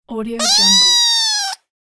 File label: cartoon baby doll